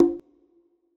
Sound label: single conga hit; Calypso